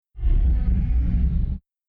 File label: wall moving